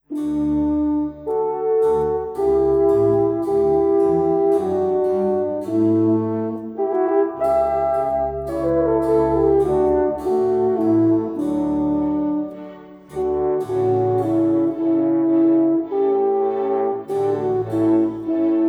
Concerto for Two Horns in D Major, Adagio cantabile